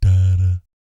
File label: BS DADAT, Vocal Planet Jazz & FX; ILIO; JAZZCOMBO